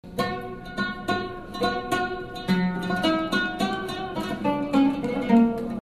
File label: loop